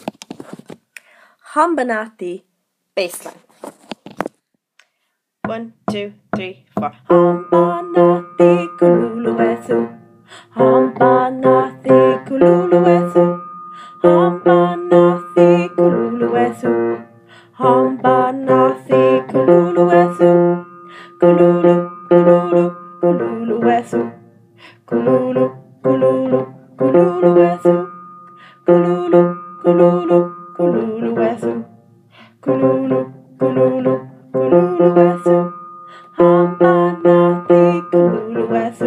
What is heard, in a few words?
Bass; Hambanathi